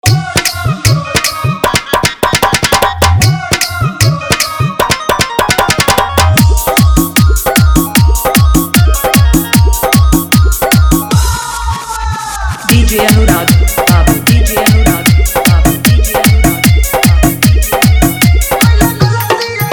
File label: Faadu Dhollki Mix